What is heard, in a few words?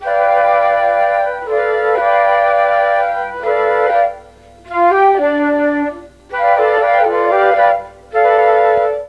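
D4 CHA CHA FLUTE, mellotron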